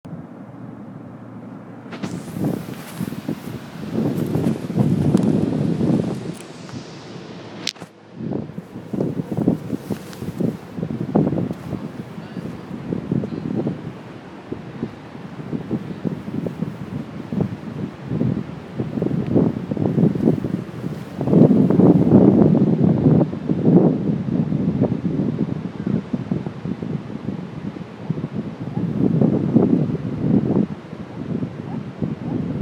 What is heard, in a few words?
Wellen ocean beach